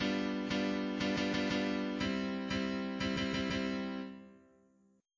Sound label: chord 4 + 4 + triplet + 4